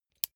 bicycle street gear control upshift

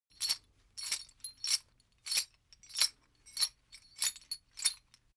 metal chain movement sequence

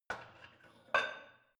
barbell light plate put on hit soft

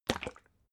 bottle syrup upend glug

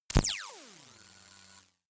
laser welder short; krieg-waffen; soundpool

